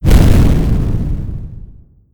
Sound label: small explosion